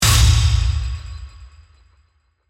Hit Metallic, Debris, Burst, Delay; Production, Element, Imaging, Accent, Transition; BlastWave

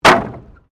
Wood Fall; Material components for constructing more complex sounds; Wood (Sound Effects:Materials)

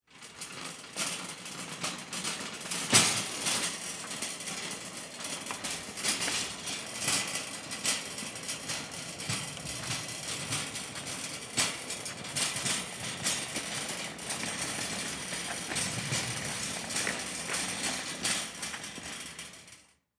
Shopping Cart Push, Long; Stores and Malls; BlastWave

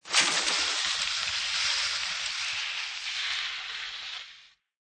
Skiing Snowboarding Jump Slide And Stop Distant

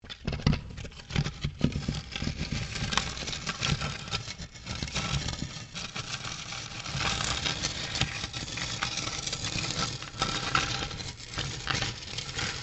Stone On Stone Large Scrape; Material components for constructing more complex sounds; Scrapes

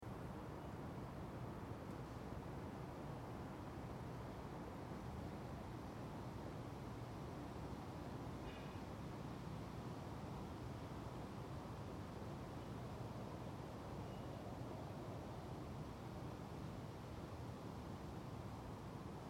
City Calm Summer Evening; Nice airy tone with a sense of light wind through trees in distance; Feeling of a distant city; Very subtle, almost unnoticeable

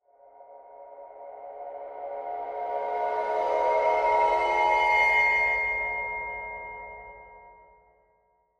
Horror Mysterious Crescendo; Crescendos; Blastwave

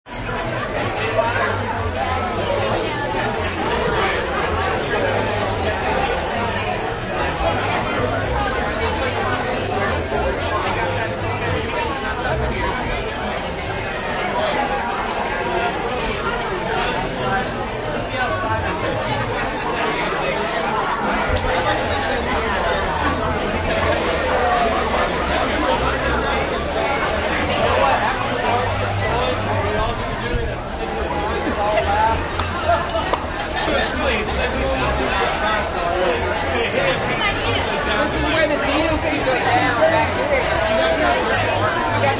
Reception, Banquets And Reception; Excellent high quality stereo recording smack in the middle of a lively banquet reception, lots of talking, laughing

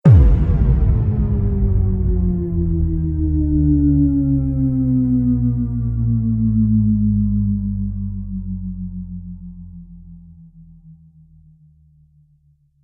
Power Down Trailer Hit

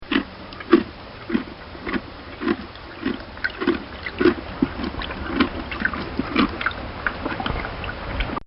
A Horse Eating Hay; Horses; Animals; Bobby Cole

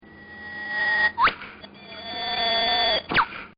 Whipbird, Birds; Sound Design; Partners In Rhyme

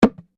Bongo High Slap, Small wodden bongo set; Drums (Sound Effects:Musical)

